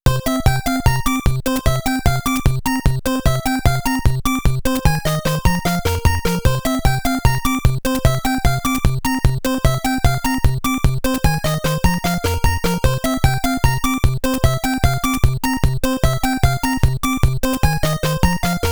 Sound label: Password Screen BGM; Magical Action 3